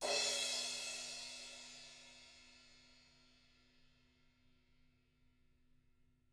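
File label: CRASH 16, CYMBAL; CYMBAL KITS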